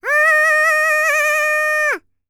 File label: MACEDONIAN, World Voices; ILIO; Vocal Planet VOL-5